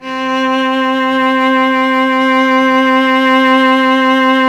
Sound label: STR VC.C, Roland LA Composer strings; Roland - LA Composer vol. 1